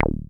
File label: 303 Short Release; Zero-G Analog To Digital 1 & 2